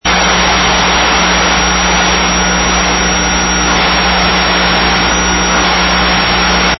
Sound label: pink noise